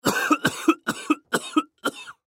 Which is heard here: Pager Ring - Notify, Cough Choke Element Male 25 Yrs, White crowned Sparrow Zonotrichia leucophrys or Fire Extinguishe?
Cough Choke Element Male 25 Yrs